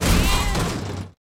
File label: Car Hit